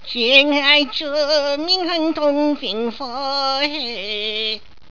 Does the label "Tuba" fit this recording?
No